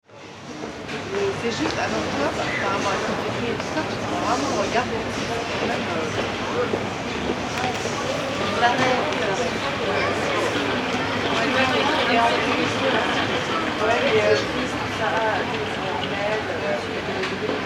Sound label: Paris Rue Monteguiele